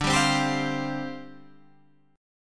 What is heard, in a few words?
F Suspended 2nd D; Fsus2/D strummed